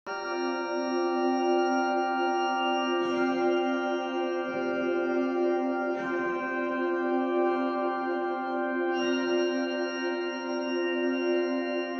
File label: ambient